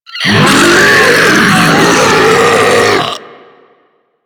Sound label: Infested Ancient Death; Enemies; Warframe; Puss Ancient